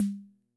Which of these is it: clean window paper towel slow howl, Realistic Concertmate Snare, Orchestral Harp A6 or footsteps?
Realistic Concertmate Snare